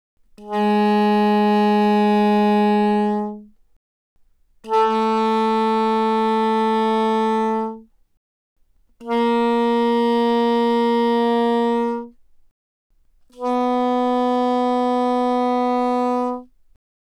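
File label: Soprano Sax No Vibrato mf Ab3 B3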